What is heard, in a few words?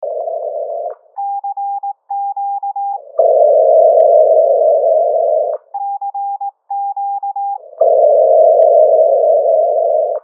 10ghz echo